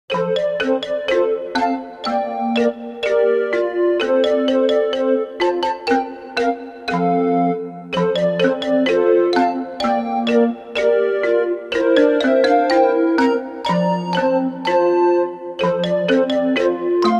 happy happy birthday music only, childrens songbook portuguese